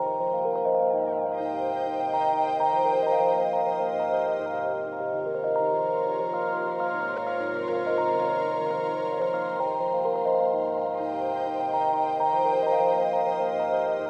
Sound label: beat